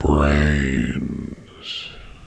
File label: neutral, zombie